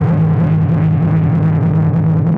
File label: Feed driven, Feedback FX; 99Sounds; Dark Engine